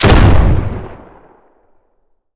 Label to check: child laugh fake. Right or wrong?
Wrong